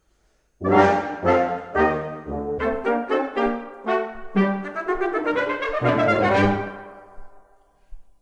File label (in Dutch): Toegift tango